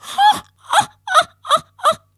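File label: voice laugh old lady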